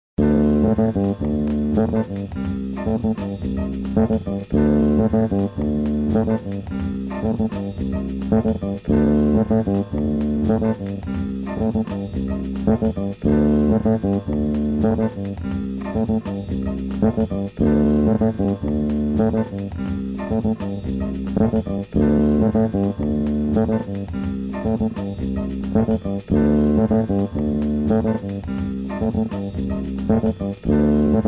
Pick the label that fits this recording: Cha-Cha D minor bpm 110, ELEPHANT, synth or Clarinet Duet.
Cha-Cha D minor bpm 110